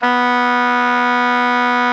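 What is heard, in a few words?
SOPRANO B 2, Saxophones, SOPRANO GROWL; GRWL; Greytsounds; Philip Wolfe David Crozier's Saxophones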